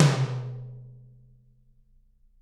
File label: TT, Toms; Bob Clearmountain Drums I